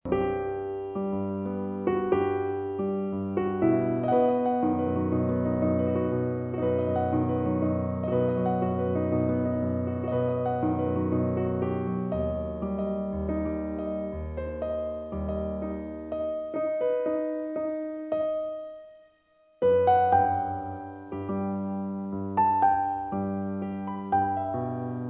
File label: Piano